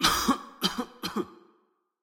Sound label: Prisoner Clear Throat